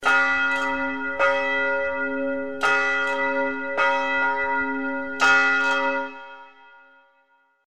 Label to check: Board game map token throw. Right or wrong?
Wrong